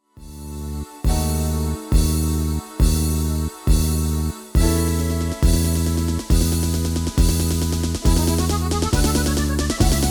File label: MIDI